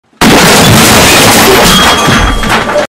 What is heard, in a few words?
big explosion metal